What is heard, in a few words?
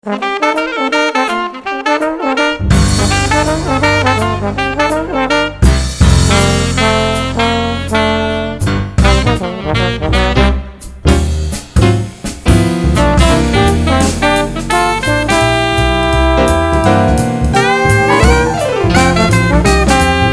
Blues